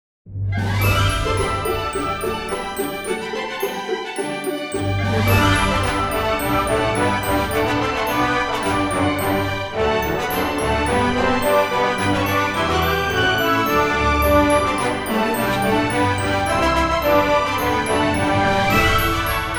deck the halls christmas orchestra

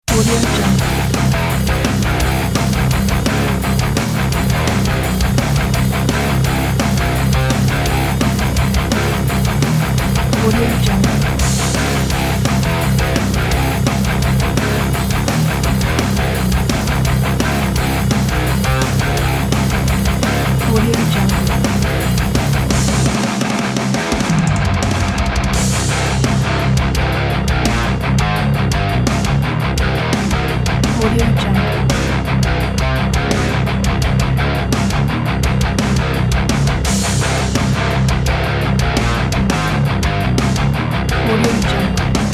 epic modern metal

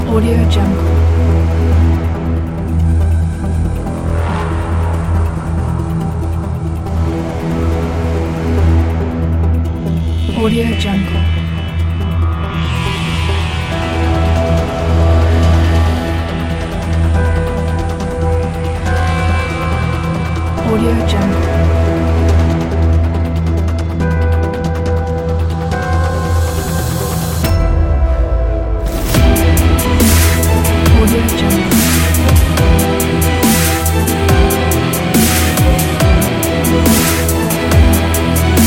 epic apocalypse

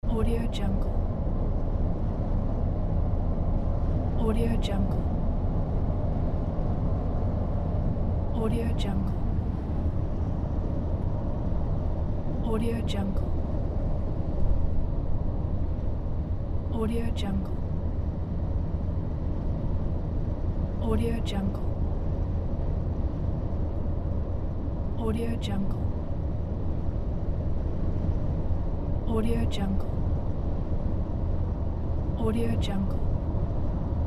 in a car on a motorway

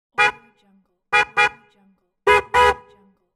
car horn in the city; holy records

